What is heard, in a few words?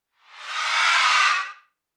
Long Ice Fly-by; Transitions; L.A. Sounds; Transitions 001